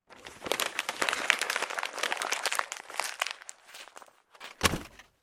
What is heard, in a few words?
Crumpling Paper